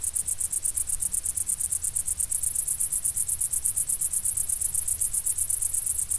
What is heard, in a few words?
crickets dry grass